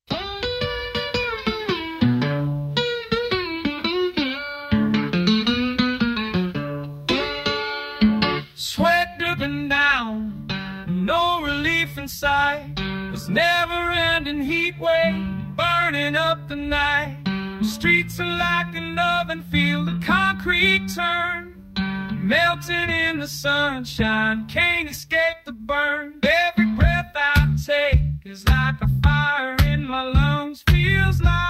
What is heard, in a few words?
Blues